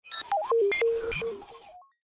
cyber beeps; Dystopia

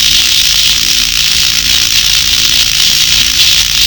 Electricity Loop; beta

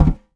metal vent, footsteps; player; Dystopia

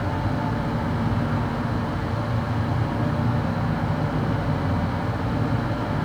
outdoor ambience; Dystopia; injection

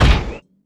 heavy grate, footsteps; player; heavyfeet; Dystopia

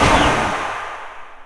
sewers elevator stop

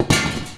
metal barrel soft; Dystopia; physics

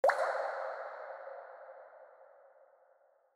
drips, injection; Dystopia

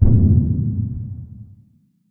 underwater explode; Dystopia

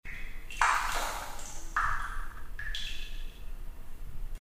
water drops; Dystopia; fortress

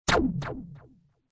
shock jab; Dystopia

